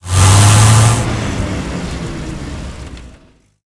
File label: m1 engine load, tanks; War Thunder